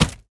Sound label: archer bow hit other